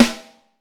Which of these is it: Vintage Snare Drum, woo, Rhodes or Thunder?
Vintage Snare Drum